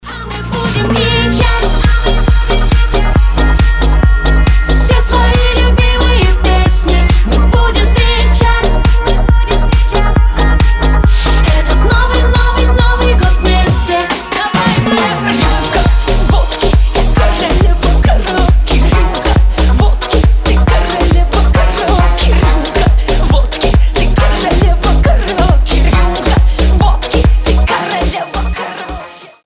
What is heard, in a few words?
karaoke